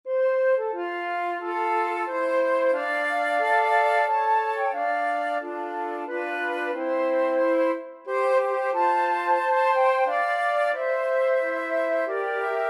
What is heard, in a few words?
star spangled banner, flute trio